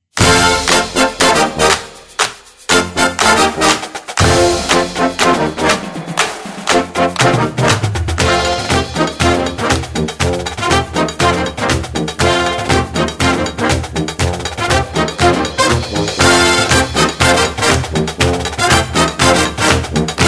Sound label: show, no voiceovers